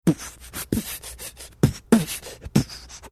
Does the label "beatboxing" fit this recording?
Yes